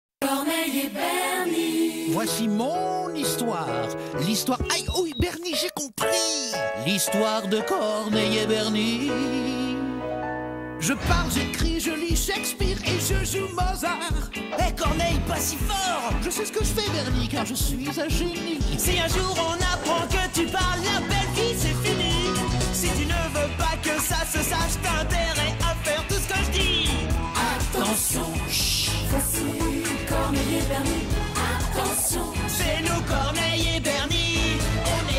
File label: Karaoke; Le generique de Corneil Bernie